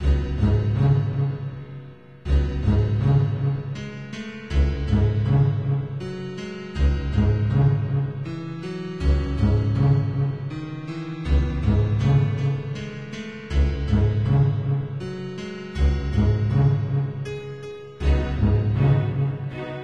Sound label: dungeon; MapleShrine